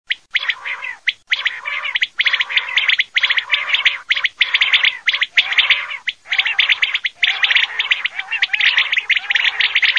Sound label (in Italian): QUAGLIA